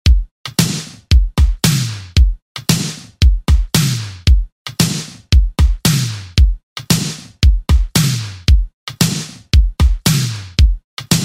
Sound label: Pop Redrum; Deejay Hit